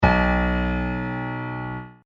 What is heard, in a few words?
B1 audio music note